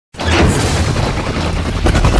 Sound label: teleport zombis; de kinodertoten